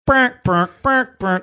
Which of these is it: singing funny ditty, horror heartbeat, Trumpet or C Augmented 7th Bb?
singing funny ditty